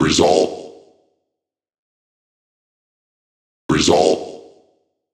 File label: Vocal Loop 130, Techno; Loops; Vocals; GhostHack; Ghosthack - Free Techno Sounds 2024